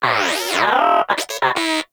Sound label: Moa Inquisitive Vocal Battle Ends; Pets; Warframe